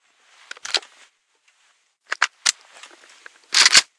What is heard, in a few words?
ak47 reload